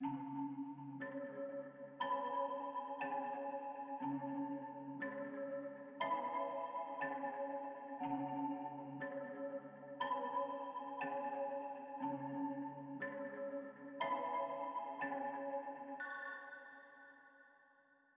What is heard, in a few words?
120 BPM C# Min Bells; Loop Stems; Cymatics; Cymatics Gems Vol 4; Death Race